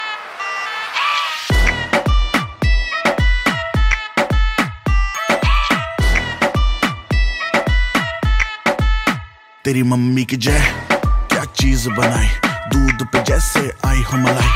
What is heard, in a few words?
latest hit song ringtone, bollywood ringtones